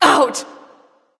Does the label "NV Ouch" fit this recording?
Yes